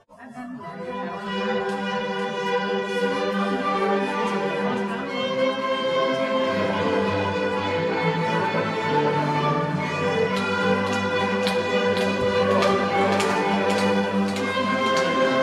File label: Jingle Bell